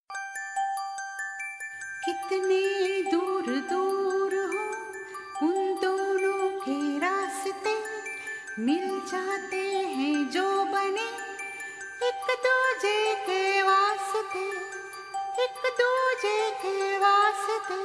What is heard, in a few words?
Love Tone